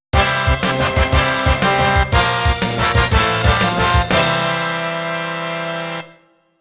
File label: Jingle - Battle Victory; Tales of the World Radiant Mythology